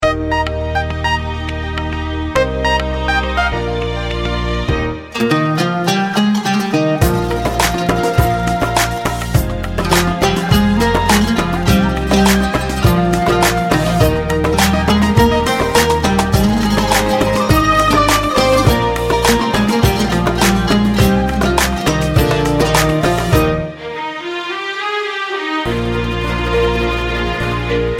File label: Nokia Tone